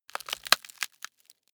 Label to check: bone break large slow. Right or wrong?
Right